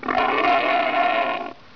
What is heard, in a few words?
pain, bullchicken